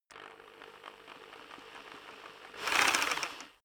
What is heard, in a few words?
rock drill hammer idle rev; maschinen; bohrer; soundpool